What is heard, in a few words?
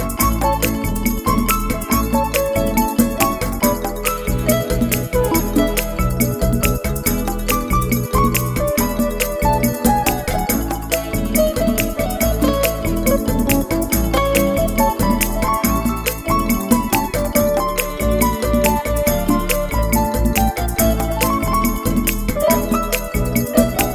Bossa Nova